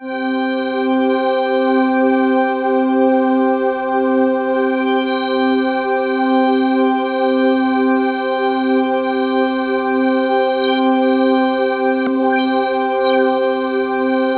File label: C4, Synth Line; 90 s Sample CDs; NiceBeats; SynthLine VOL-17